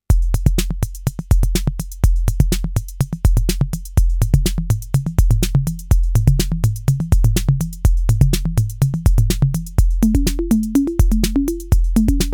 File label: 522 Tom Conga